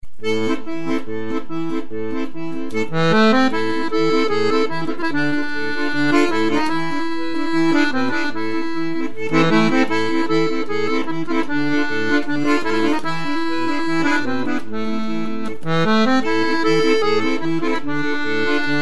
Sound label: klezmer